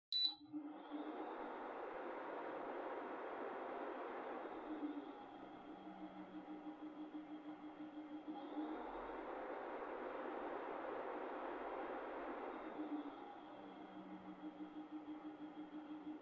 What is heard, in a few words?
Electric Fan Far Rhythm Low